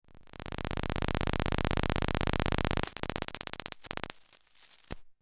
Multiple lamps and cables pulsating energy textures